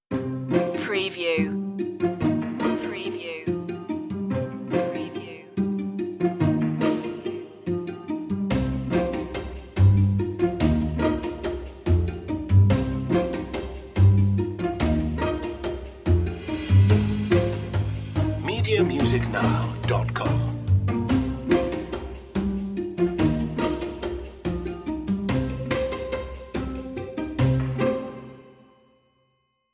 curious machine underscore

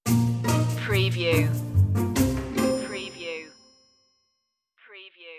curious machine stinger

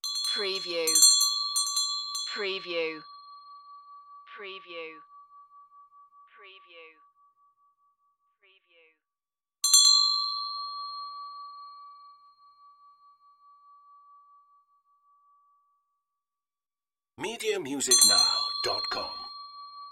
pull bell

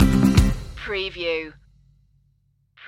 undiscovered light stinger

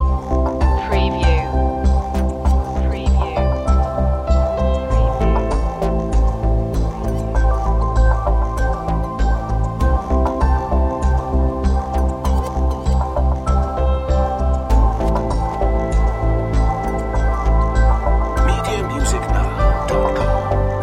digital evolution ambient bg

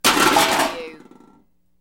Metal Debris Impact Settle

